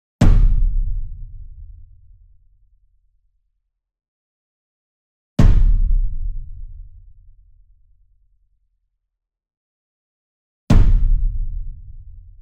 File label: SINGLE DRUM Bass Drum Concert 42 Inch Hit Hard Beater Near; boom library; Cinematic Strikes Construction Kit